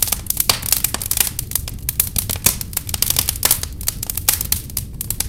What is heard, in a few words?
big fire